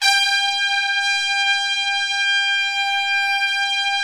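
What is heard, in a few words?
brass trumpets section; Roland LCDP06 Brass Sections; Tpts Sec.2-3; Tps Sec.3 f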